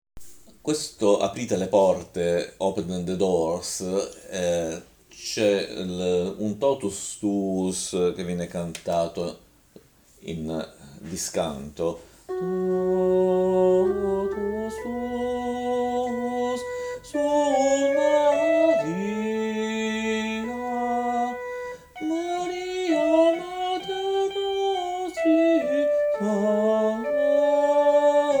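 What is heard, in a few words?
open the doors bass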